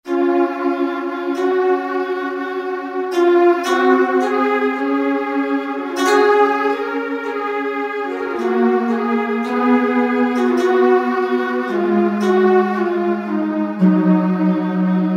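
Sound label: Strings, Roland V-Synth; Factory Patches; 178 Stringerz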